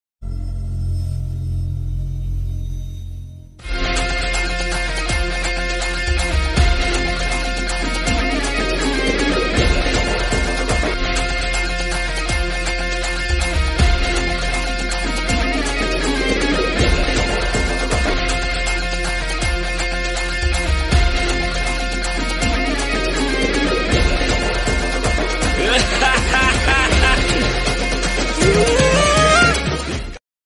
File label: naan sirithal bgm ringtone